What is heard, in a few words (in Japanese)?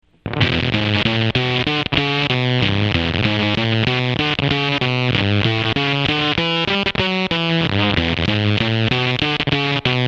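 comp fuzz, Comp fuzz 「プチプチ」と音が切れるようなファズサウンド。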